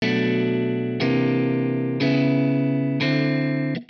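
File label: amp wet guitar chords, Hybrid neural guitar overdrive; Morphdrive; Demos for hybrid configurations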